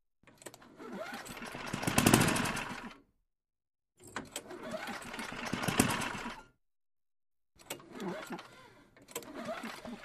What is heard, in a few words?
Lawn Mower; False Starts; Several False Starts; When Cord Is Pulled, Engine Spins But Does Not Start ( Several Attempts ). Medium Close Perspective. Small Engine.